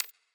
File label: count Up; netent